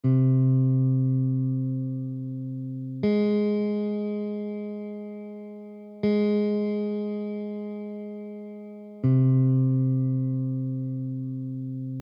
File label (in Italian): Intervallo di 6a minore ascendente e discendente